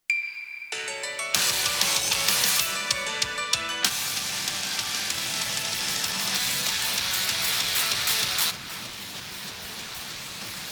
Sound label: Acapella; Gintama